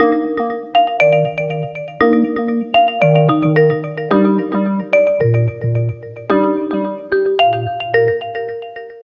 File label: ringtone; oppo f7